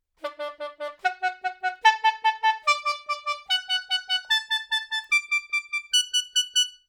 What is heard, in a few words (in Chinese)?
传统笙 演奏技法 双吐